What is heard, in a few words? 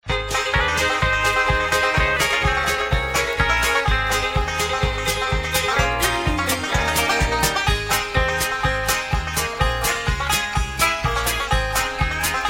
music